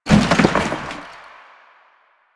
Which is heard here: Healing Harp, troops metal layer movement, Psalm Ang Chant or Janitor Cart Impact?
Janitor Cart Impact